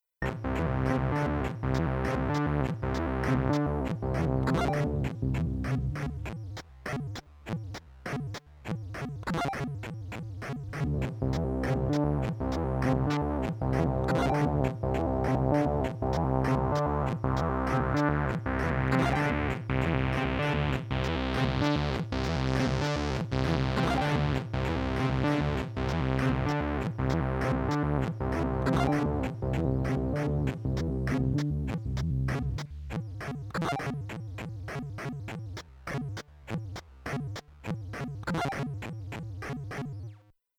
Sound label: SID SEQ2